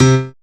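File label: piano C, House Selection, Dance Synths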